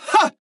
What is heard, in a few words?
Grunt Jump